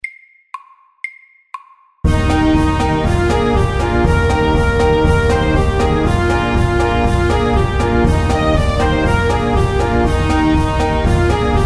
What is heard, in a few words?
Cancan, 120